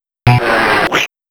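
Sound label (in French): Avions de chasse, du son cartoonesque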